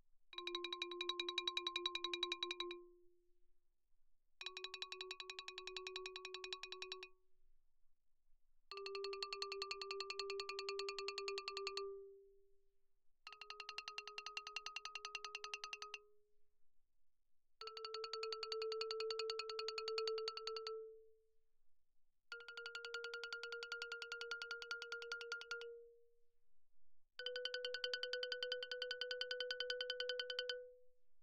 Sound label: xylophone rosewood roll mf F4 B4; Percussion